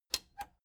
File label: plastic button latch squeak click enable slow